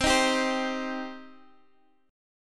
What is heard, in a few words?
G Suspended 4th Sharp 5th C